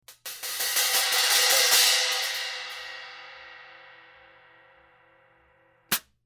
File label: K Custom FX Hi Hats Pattern